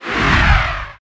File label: whoosh